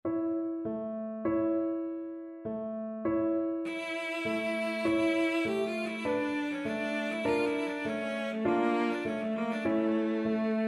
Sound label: bartok romanian folkdance, cello